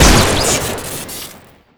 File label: shoot crit; tf2classic